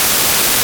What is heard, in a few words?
White Noise; Digital Sound Factory Proteus 2000